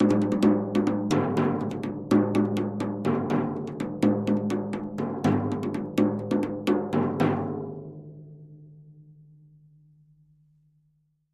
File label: Drums Thin Toms Rhyth